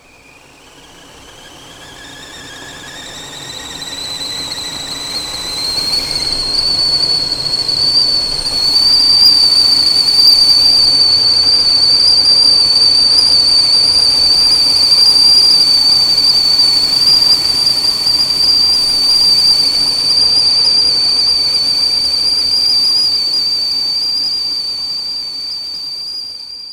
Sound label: teapot; ChucK